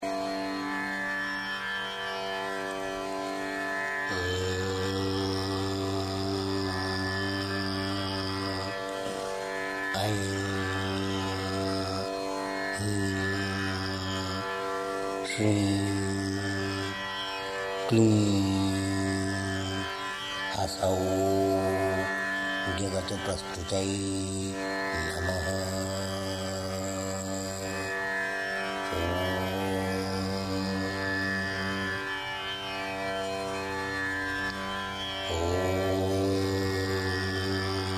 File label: kamala mantra; av yoga; mantry